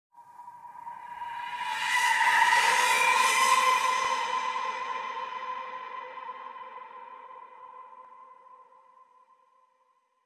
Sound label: jumpscare; neptune